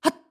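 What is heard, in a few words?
Climb, voice; BOTW; Breath of the Wild; Link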